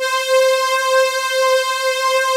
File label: FATTY STRNGS; Pro-Rec - Synthsonic; Z1 PADS